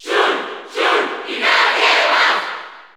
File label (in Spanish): Cheer Spanish; Shulk; SSBU